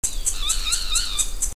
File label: Megarynchus pitangua nyah